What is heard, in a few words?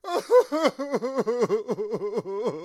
cry male; Voice; Human